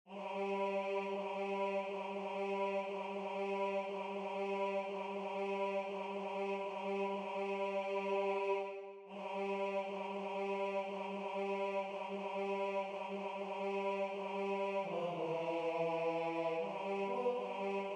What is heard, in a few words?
Tenor